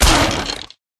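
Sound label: Dragon Skeleton wound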